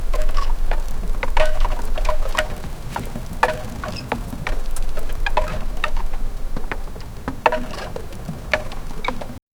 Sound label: sound effect a small boat, calm